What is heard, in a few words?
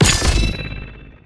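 sword, impact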